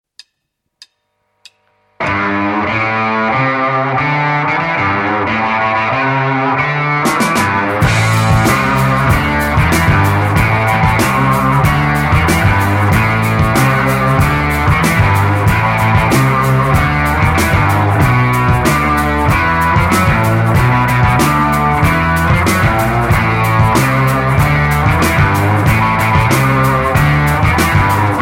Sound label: guitar